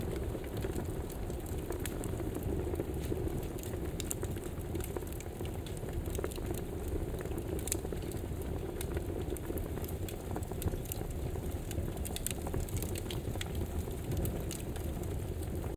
Fire; Apple; iOS-18.1; HearingUtilities.framework

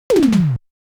80s Toms; Samsung Galaxy S22; Retro

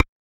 Keypress Spacebar

